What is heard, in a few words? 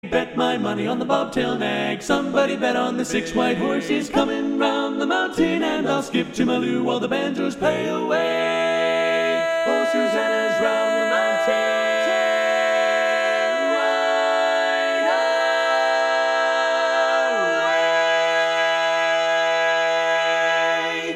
Lead, barbershop